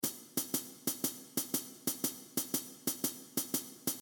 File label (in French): Rythme Shuffle, guitare